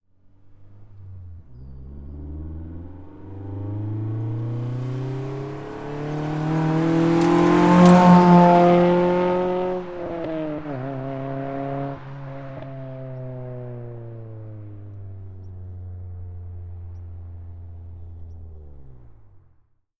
Integra, rev approach, fast by, quick decelerate away, Acura Integra